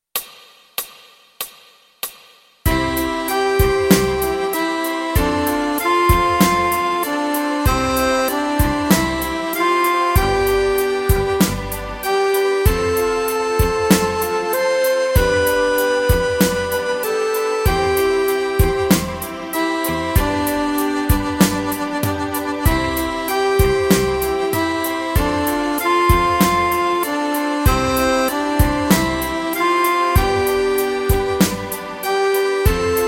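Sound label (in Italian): Sulla luna, Fisarmonica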